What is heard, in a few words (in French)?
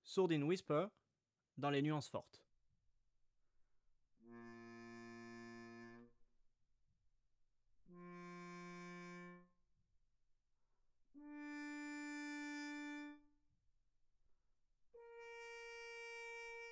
sourdine whisper nuances fortes, trombone; Sourdines; Sourdines fixees; traite orchestration; trombone tenor trombone basse